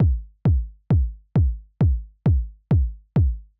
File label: Dark Room Techno